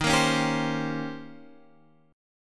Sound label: Eb 13th, Eb13 chord; Eb13 strummed; x,6,8,6,8,8; JGuitar